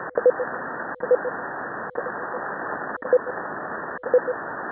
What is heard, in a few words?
QSK double echo